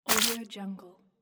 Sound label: wet take